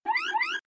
Warble; Titan

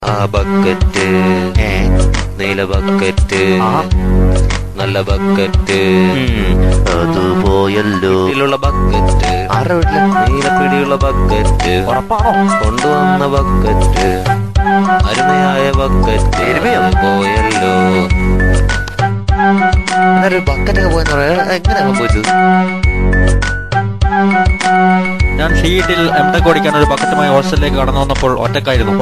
bucket funny song

